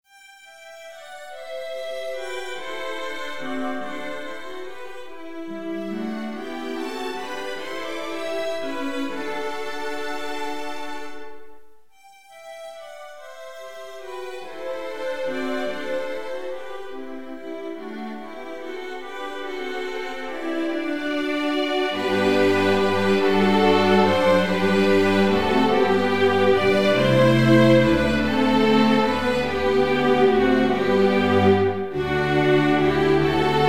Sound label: string orchester